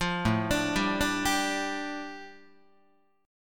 A 7th Suspended 4th Sharp 5th A, Guitar; arpeggiated

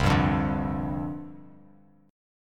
C Suspended 2nd Suspended 4th C Bass Standard; Csus2sus4 strummed

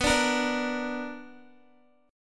B Minor Major 7th, Guitar; BmM7 strummed

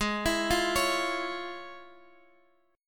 F Minor Major 7th Sharp 5th, G sharp

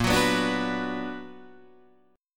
B Minor Bb, Bm/Bb chord, guitar; strummed

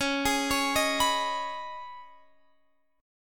C sharp 7th Suspended 2nd, Guitar Open F; C#7sus2; arpeggiated; guitar chord

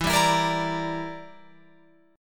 E Minor 7th Flat 5th, Guitar, Open F; Em7b5; strummed

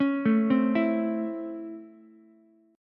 Db Minor Major 7th Sharp 5th Db Ukulele; DbmM7#5 arpeggiated; JGuitar

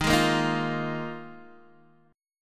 Eb Suspended 2nd Suspended 4th, Guitar, DADGAD

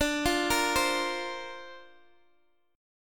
D-Minor 7th Sharp 5th D Guitar DADGAD, chord

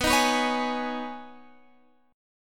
B 6th Add 9th B down Guitar Drop D

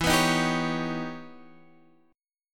E Minor Major 13th E; EmM13 strummed; x,7,9,8,8,9

